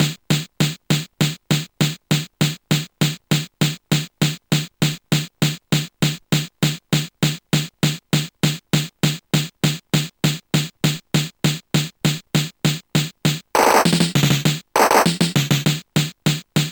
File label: drums; Deluxe Music Construction Set